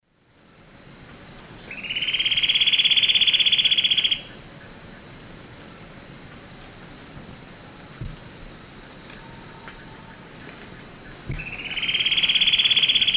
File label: Conopophaga aurita, song male; S. America; Eastern Lowlands